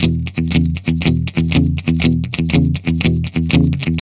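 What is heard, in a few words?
120 E, Vintage Blues Guitar rhythm; USB Soundscan vol.22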